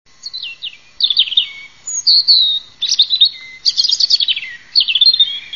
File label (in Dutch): roodborst